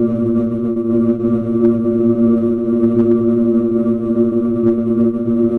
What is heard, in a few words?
hum; AssaultCube